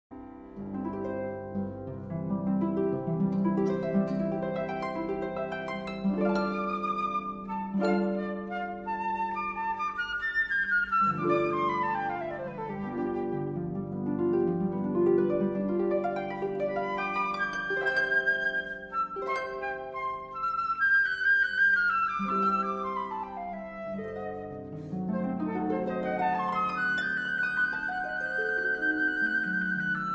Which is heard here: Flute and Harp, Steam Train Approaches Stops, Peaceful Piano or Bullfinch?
Flute and Harp